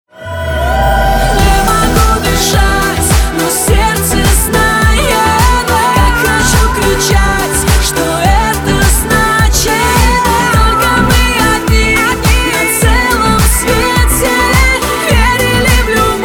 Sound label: soprano